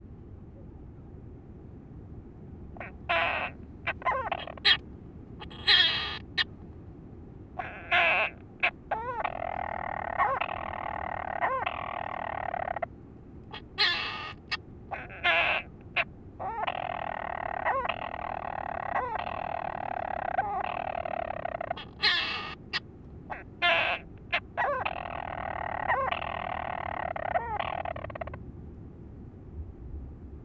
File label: chatter